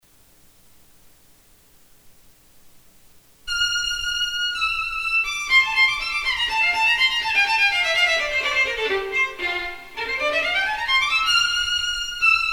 Violin set; Tchaikovsky

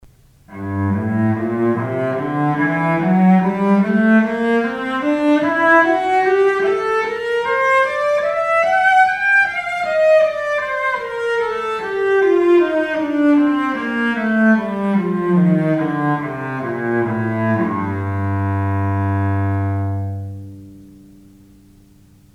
cello g minor

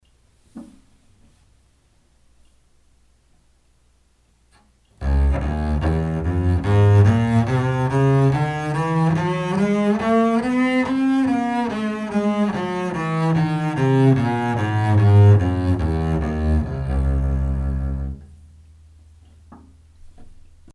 Bass c minor

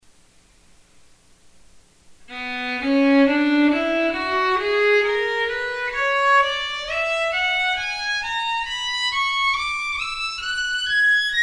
Violin b minor

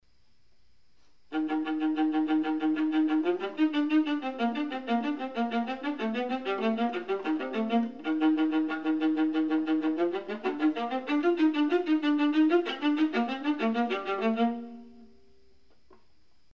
Viola set; Beethoven

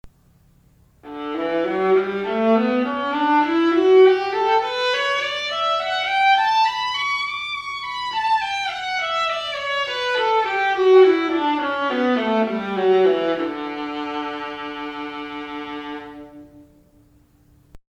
viola D major

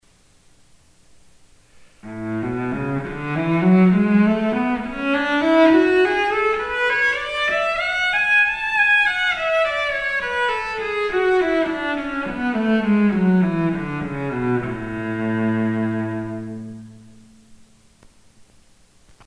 Cello A major

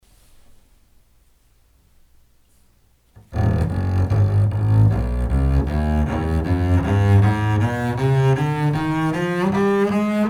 Bass E major

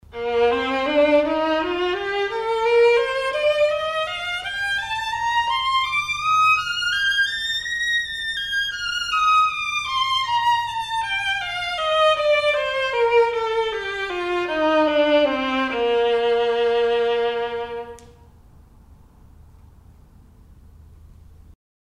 B flat major

